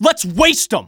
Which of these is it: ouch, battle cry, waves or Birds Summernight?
battle cry